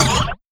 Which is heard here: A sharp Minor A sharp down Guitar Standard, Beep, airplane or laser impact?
laser impact